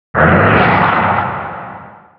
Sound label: battle trance cast team; troll warlord